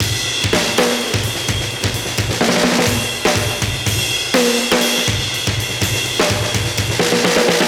125 Classic Breaks, 125

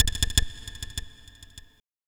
TOM INDST 140, Industrial Drum

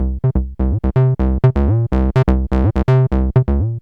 TB 125, TB-303 LOOPS

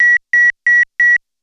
BEEP; VOICES

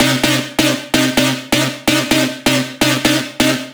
synth 128 Cm; Anthemic; synth loops

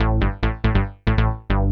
SNTH BASS ELCTR 140 A, Techno

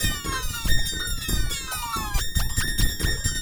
TECHNO FX 140

